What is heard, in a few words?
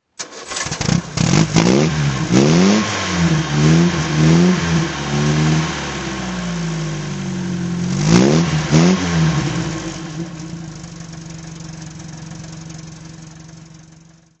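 mg tc throttle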